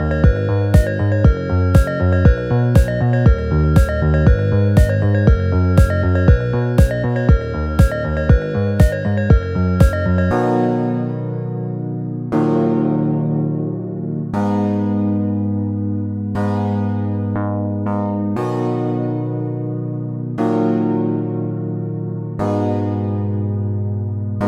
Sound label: Instrumental Sped Up